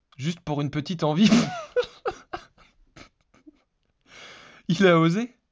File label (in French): rire naturel